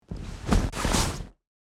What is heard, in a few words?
Winter jacket handle; clothing